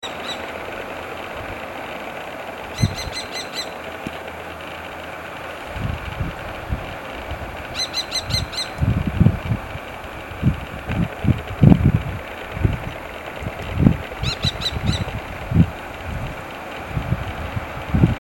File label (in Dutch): Kattenalarm; vogelgeluiden